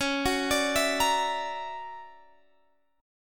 D Augmented Major 9th Db, guitar

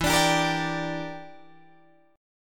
E Minor 7th Flat 5th E, Guitar Drop D

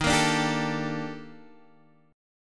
D sharp Major Sharp 11th, Guitar, Open G

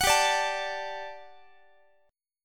G Major 7th Suspended 2nd G down Guitar; GM7sus2; strummed; x,x,21,17,18,20

